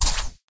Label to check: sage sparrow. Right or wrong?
Wrong